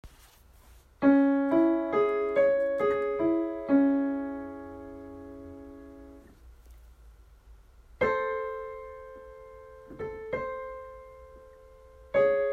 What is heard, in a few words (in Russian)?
Интервалы до диез минор